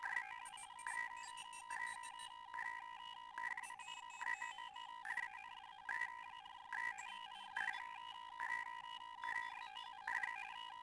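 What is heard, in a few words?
telemetry loop; Star Wars Obi-Wan; XBOX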